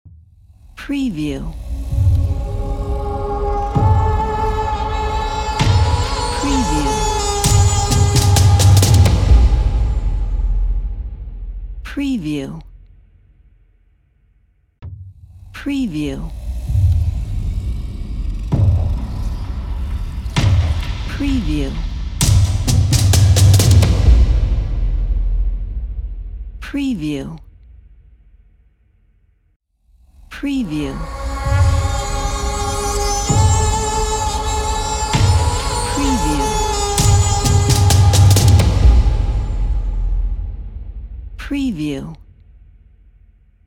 epic logo

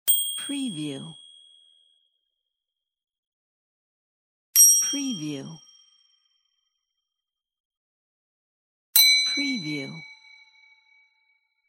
ding

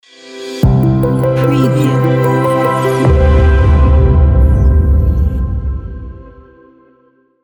opening logo

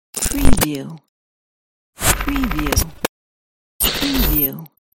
digital glitch

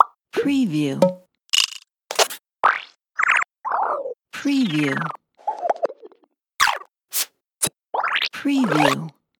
motion graphics sound effects interface

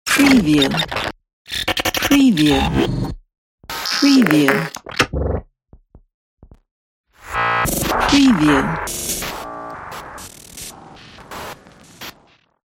glitches

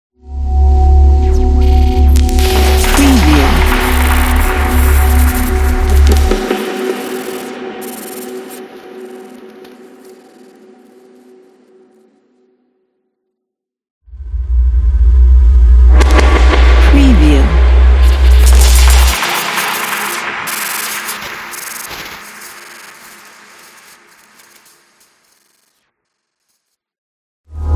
glitch logo reveal

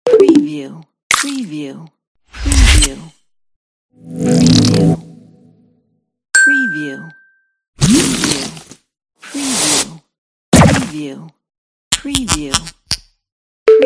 infographic sound effects

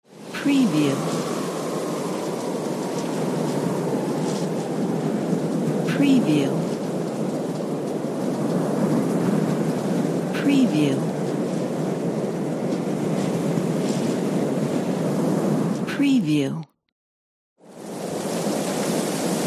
rain and wind

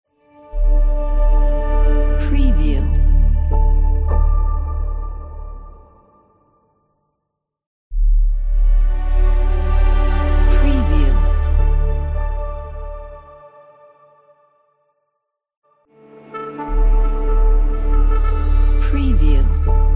a shimmer introduction

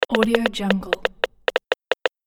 smartphone typing

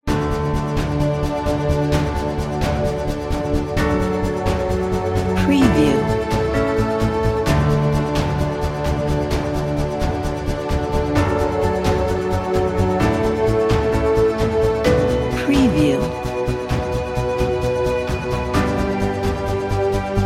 positive days

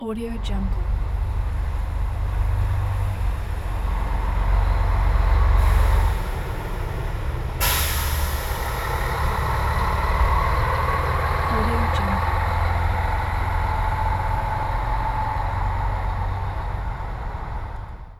large bus passby with air brake

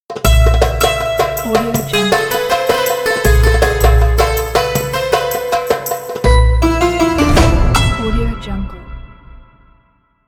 logo ramadan

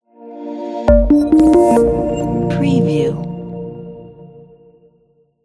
soft corporate logo

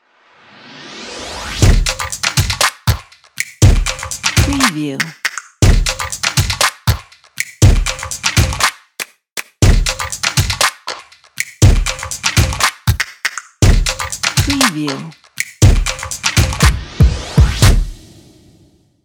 simple stomp clap snap

